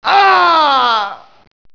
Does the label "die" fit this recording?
Yes